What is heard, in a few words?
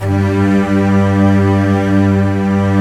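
orchestra strings G1; GRANDSTRG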